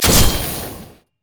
basicattack; Yasuo

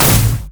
grenade; rumble

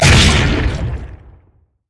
bounce large; LoL; zac base

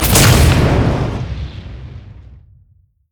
summonerspell base ignite; LoL

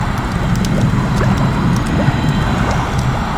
witchs cauldron

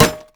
basic attacks; Dr. Mundo; TPA Mundo

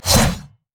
basic attack sword; rengar

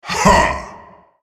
effort; Nasus; Character Voices - English

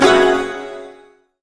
guqin basic attack; LoL; guqin sona

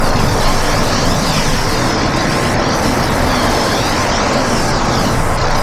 champion channel loop; odin

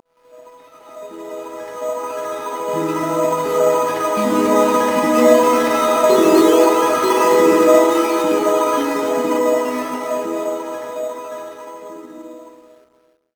melodies; sona; paxsona

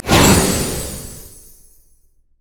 health buff; rengar

